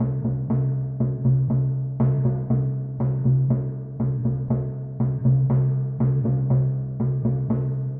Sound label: Timpani, Orchestral; Sonic Foundry (Sony Creative Software); Orchestral VOL-2; Modern 11